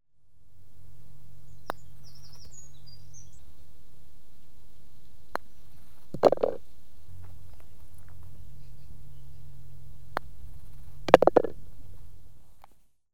Golf - 3 putting shots (2 & 3 into the hole). Some birdsong; The BBC Sound Effects Library; Sport and Leisure